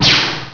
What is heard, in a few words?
petrify; Exile III v1.0